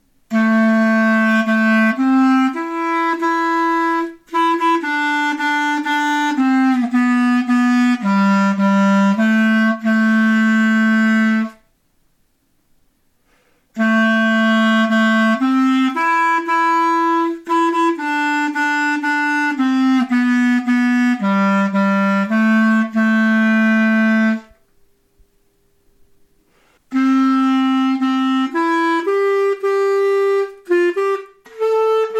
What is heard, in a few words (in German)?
Klarinette H moll